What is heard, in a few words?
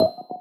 Stack Click